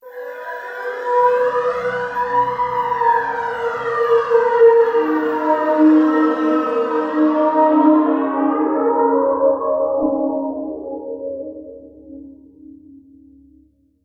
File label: Tonal FX G#